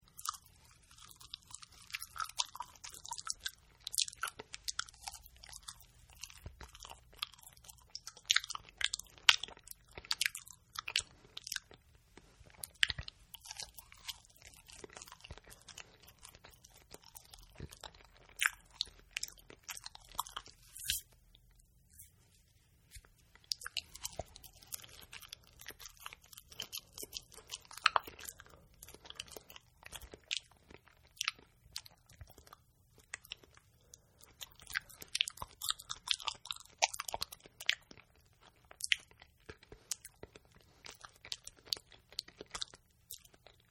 Man Eating Banana, Man Eating A Banana-Sloppy Eating And Smacking Of Lips; Human